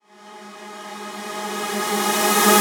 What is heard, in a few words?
Trance Sweeps; Vengeance Samples; VEC2 FX